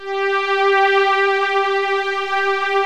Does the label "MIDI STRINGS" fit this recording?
Yes